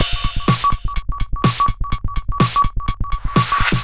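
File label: No bass drum, Drum Loops 125bpm, Nu Dance Electro; Zero-G; Soundclash Nu Dance Electro; Acidized Wavs; Semi-Skimmed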